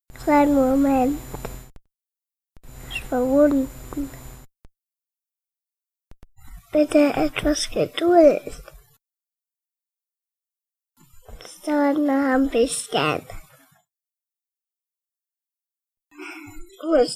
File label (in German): warteschleife